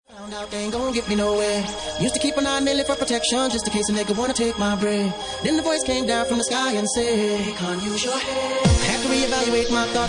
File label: Organ Mix